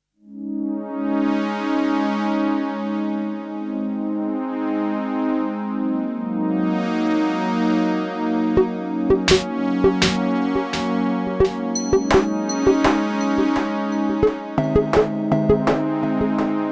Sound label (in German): flaechen